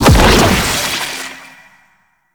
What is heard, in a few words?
Gouache Teleport In A; Warframe; Follie